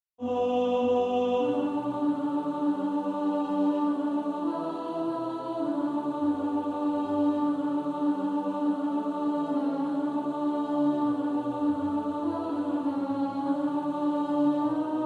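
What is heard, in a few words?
alt, practice music; Alto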